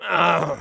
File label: Soldier negative vocalization, de; stöhnen